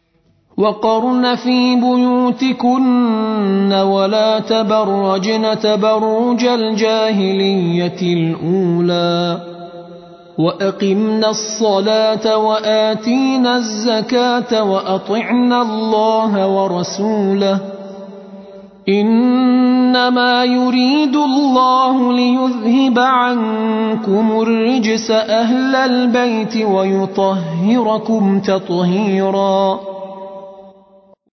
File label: Tar